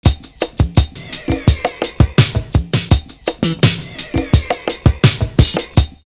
PERC N SLAP; Univers Sons; 84 LOOP

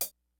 HOUSE KIT, DRUMS; Kid Nepro; Elite

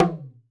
Bent Bongo, Alesis D4

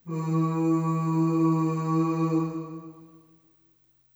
Ooh Short E2 Short Reverb; Harmonic Building Blocks

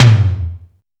DANCE SET, DRUMS

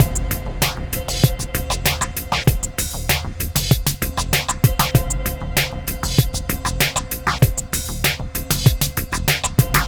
Darkwave, Processed Percussion

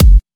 Electro Kicks

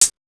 HH CLOSED; USB Soundscan vol.20 - Fresh Disco House I

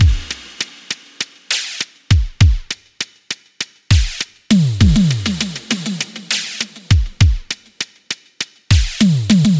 100 Beat Octagon; Beats; Zero-G; Future Wave

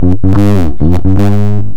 SATUR BASS; LOOPBASS KIT; AKAI; Big Beat Samples & More

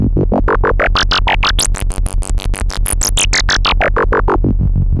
Moog F#2; Hollywood Edge; Giorgio Moroder Rare Synthesizer Collection; MLTI.MOOG

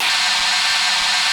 D70 CHINA, D70 DRUMS; Crypton - DrumBase5000

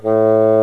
WOODWINDS

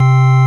ORG VOX C, Continental

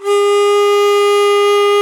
World Winds, Old World Instruments

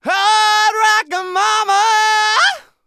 ROCK, MALE HUMAN; ILIO; Vocal Planet

